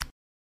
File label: roulette start